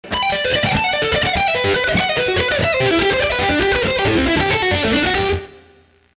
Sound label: Lick